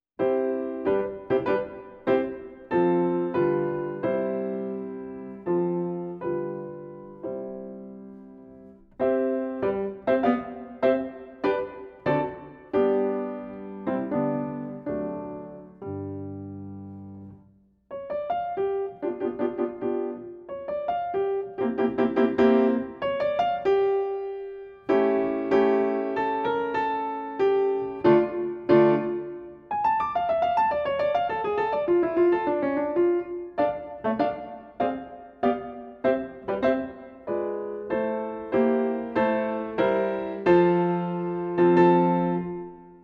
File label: Prelude in C Major; op 119, no 1; Stephen Heller; Celebrate Theory recordings